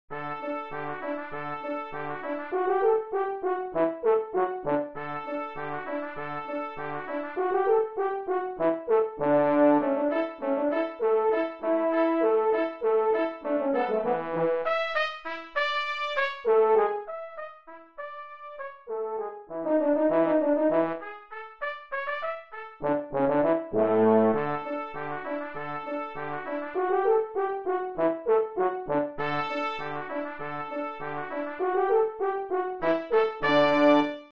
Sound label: musette